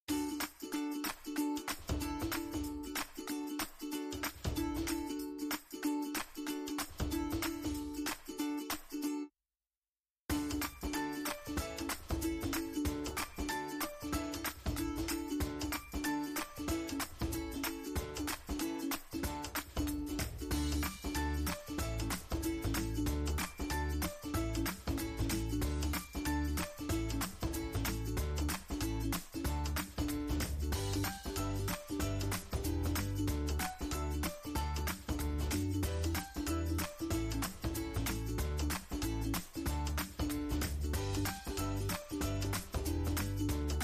music cheerful; bgm

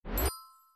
right answer effect

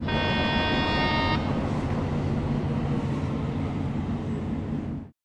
train

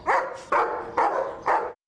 dog

